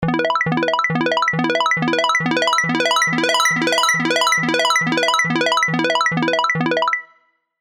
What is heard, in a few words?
Synth Loop E 138 BPM; Sylenth1; Winner